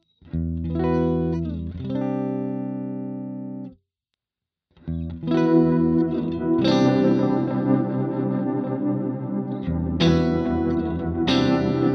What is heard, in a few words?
Mode IV Chords